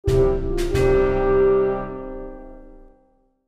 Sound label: diplomatic bad